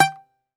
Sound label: Greek Bazouki, Plucked Strings